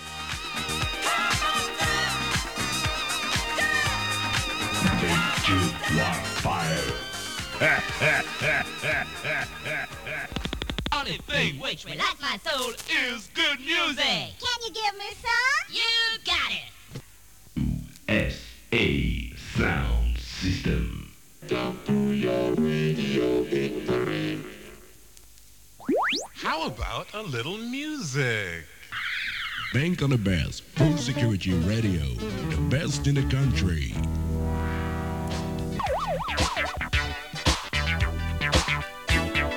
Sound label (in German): mehrere jingles